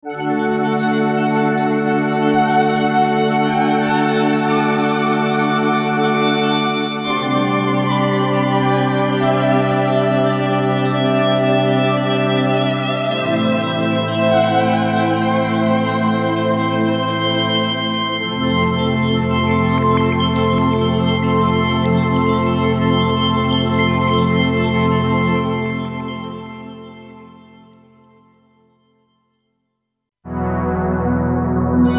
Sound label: synthpad